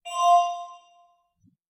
info computer startup; comms; CosmicRage

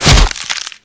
break, combat; CosmicRage

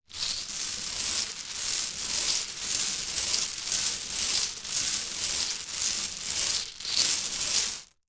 ball roll, bingo

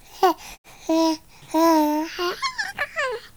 giggle, baby

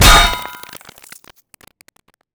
metal; CosmicRage; general combat weapons generic rifle

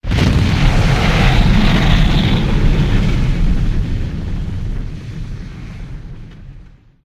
fire, combat; CosmicRage

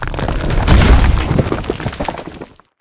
step, megasuit; combat; larkan sound improvements

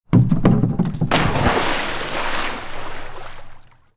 diving board

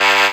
scanner red; CosmicRage

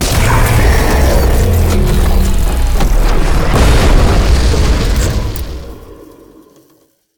die; combat creatures bigdroid he; droidic sounds; CosmicRageSounds

